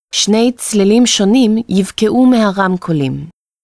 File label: Two Tones